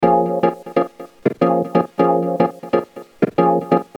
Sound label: complex organ